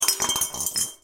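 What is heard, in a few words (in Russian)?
перемешивания ложки в стеклянном стакане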